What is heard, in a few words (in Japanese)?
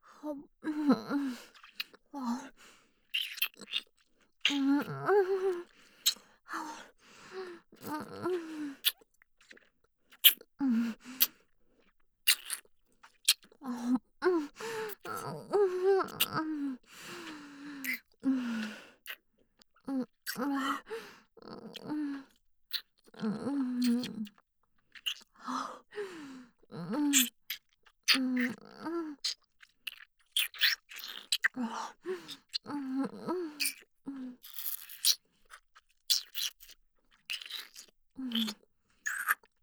フェラ音